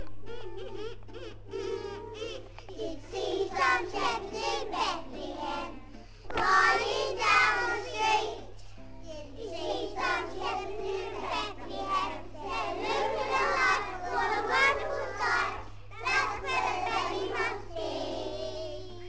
singing did you see some shepherds in bethlehem

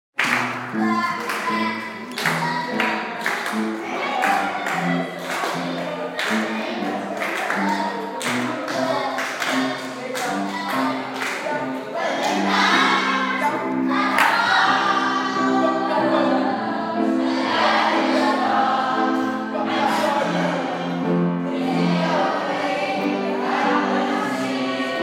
choir

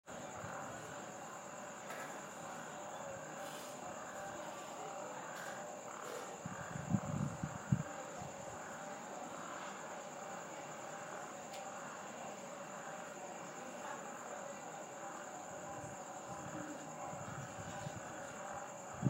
the sound of dakar at night with frogs

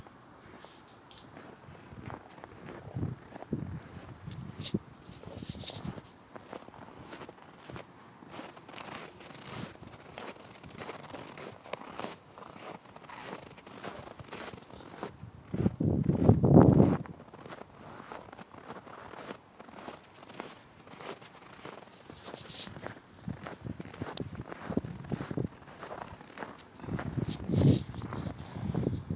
winter in schenley park in pittsburgh

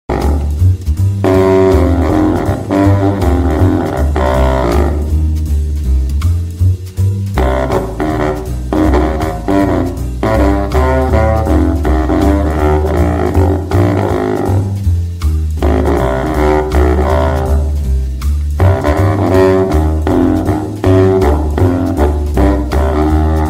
plays the j elle stainer contrabass saxophone; hans kuijt

